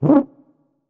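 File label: sounds of tuba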